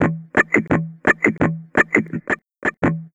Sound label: Bb, 160, Guitars; Zero-G; Total Drum Bass